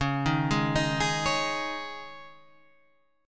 C sharp Suspended 2nd, C sharp; C#sus2 Chord {9 6 6 8 9 9} arpeggiated

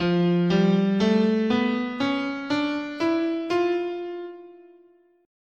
F Lydian Augmented, scale

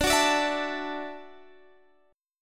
D-Major Add 9th, D, Guitar, DADGAD

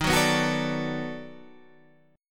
B 7th Sharp 9th, guitar

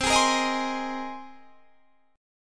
C Minor 7th Sharp 5th C; Cm7#5 strummed

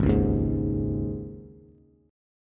Ab Suspended 4th, Bass; Absus4 strummed; JGuitar

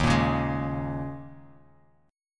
Eb 7th Suspended 4th; Eb7sus4; strummed; 11,13,11,13; JGuitar

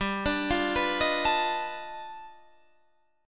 G 7th Flat 13th, G, guitar chord; arpeggiated

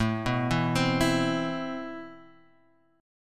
C Major 7th, A, guitar chord; Cmaj7/A arpeggiated; JGuitar

